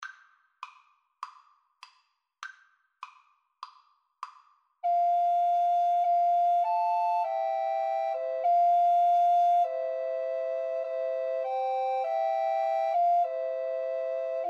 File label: my faith looks up, Recorder Trio; SAT